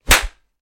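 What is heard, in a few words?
Face Slaps Heavy Sharp; flesh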